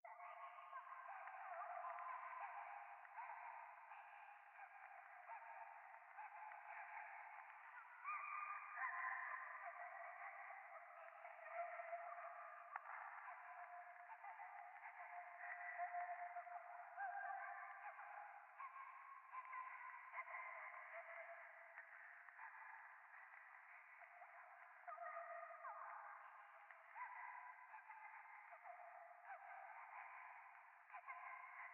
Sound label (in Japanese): ゴースト 可愛い喘ぎ 暖炉